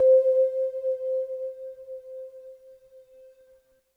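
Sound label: sine C4, Yamaha VP-1; Music Store; 90's Sample CDs; NICE SINUS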